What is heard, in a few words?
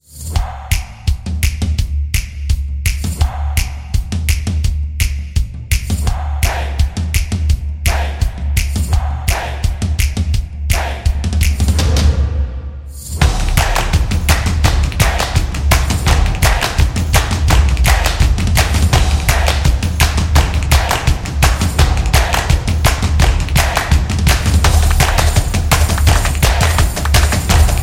Beat Up